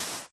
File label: sand, dig; Minecraft